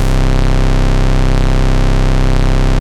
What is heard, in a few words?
E Bass C1, Moog Voyager; Moog Voyager Sounds; VES1 E Bass